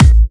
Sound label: Electro Kicks; Vengeance - Essential Clubsounds Vol.4; Bassdrums